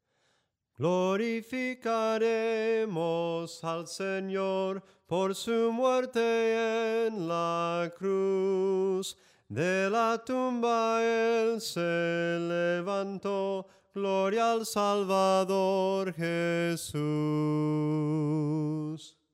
SOPRANO CANTADO, himnos en melodia soprano

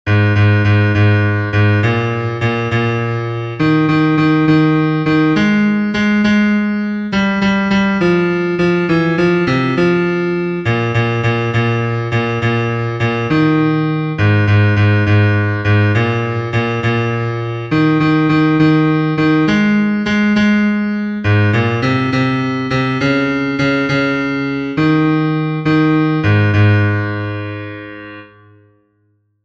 BAJO, himnos en piano; cantos del camino

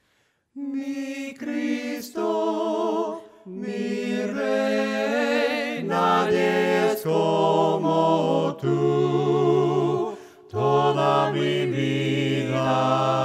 VOCES, himnos a capella; cantos del camino; CANTA AL SENOR

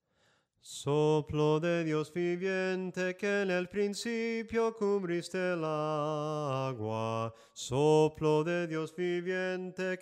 SOPRANO CANTADO, himnos en melodia soprano

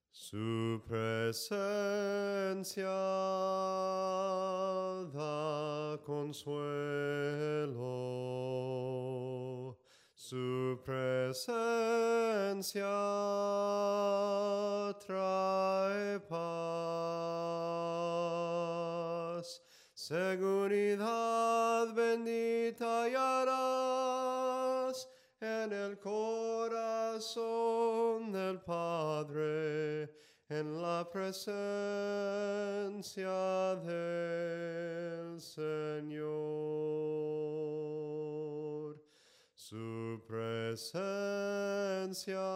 SOPRANO CANTADO, himnos

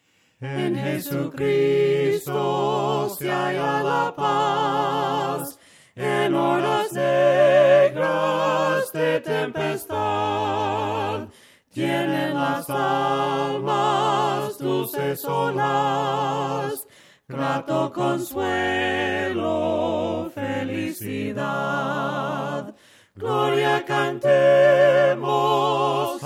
VOCES, himnos a capella; cantos del camino; EN JESUCRISTO

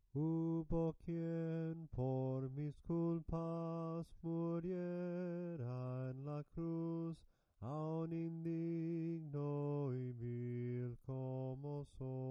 SOPRANO CANTADO, himnos en melodia soprano; cantos del camino

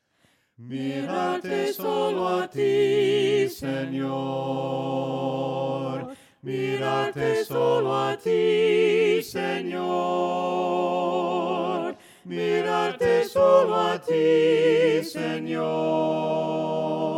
VOCES, himnos a capella; cantos del camino

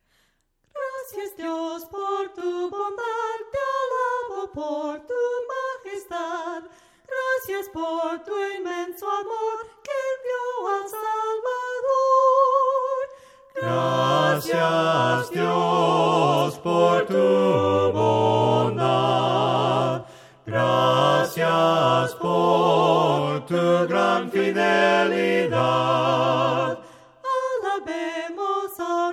VOCES, himnos a capella; cantos del camino

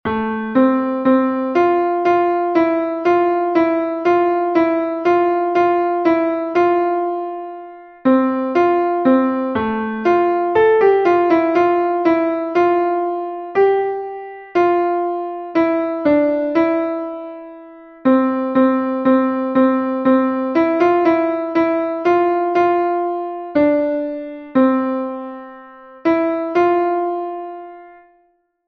CONTRALTO, himnos en piano; 80 LOORES DAD A CRISTO EL REY; cantos del camino

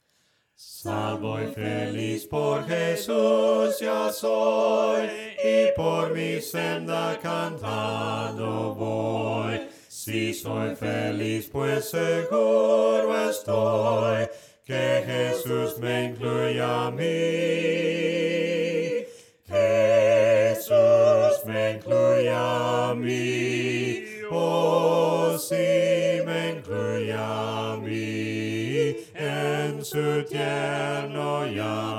JESUS ME INCLUYE A MI VOCES, himnos a capella; cantos del camino

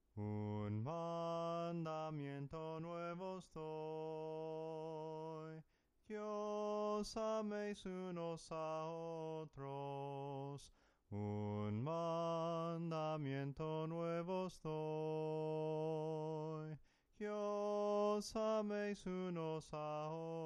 SOPRANO CANTADO, himnos en melodia soprano

